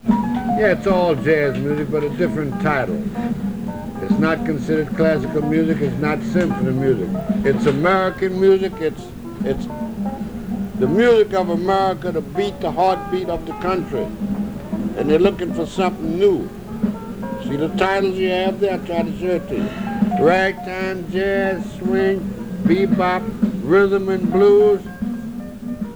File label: Heartbeat